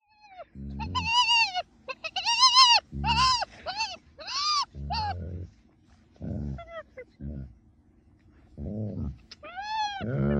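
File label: Hyenas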